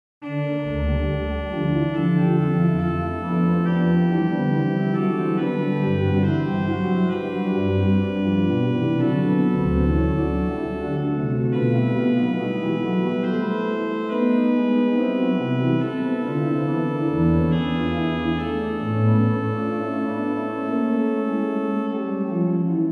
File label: Noel, Organ Music, Souvigny; Boely